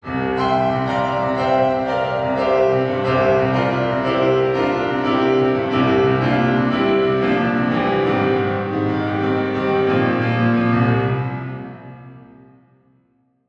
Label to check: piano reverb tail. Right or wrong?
Right